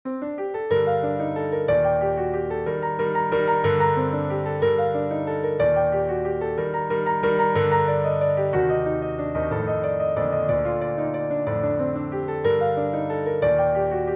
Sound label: Prelude G minor